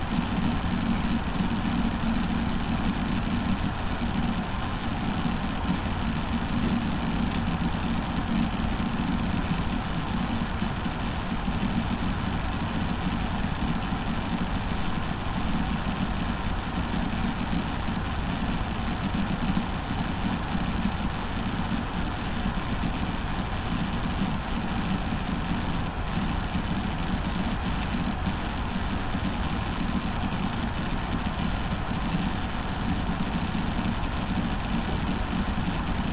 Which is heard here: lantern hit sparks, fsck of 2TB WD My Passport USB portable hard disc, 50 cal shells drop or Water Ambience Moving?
fsck of 2TB WD My Passport USB portable hard disc